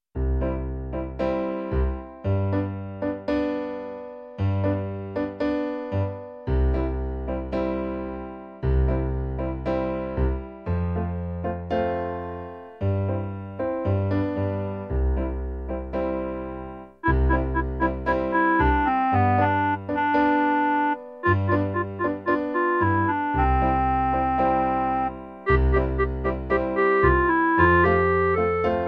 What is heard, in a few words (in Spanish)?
clarinete; musica I ESO 2015